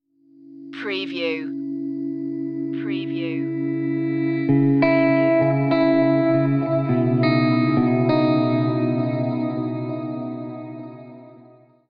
sedated stinger

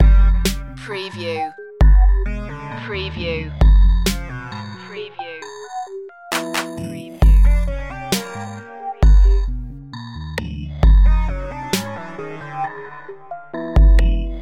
saw melt loop

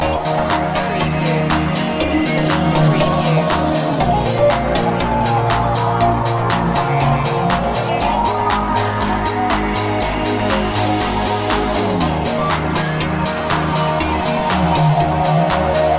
soft techno pulse loop

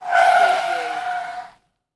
Auto Tires Squeal; sound effects bible

